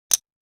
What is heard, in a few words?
chip collide